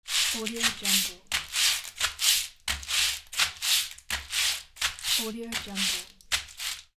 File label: doormat